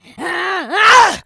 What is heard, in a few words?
rage, infected, female